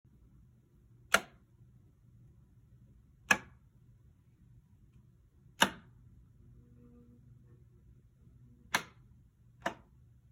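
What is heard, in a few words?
home light switch turning on and off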